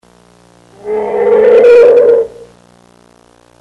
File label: elephant; animals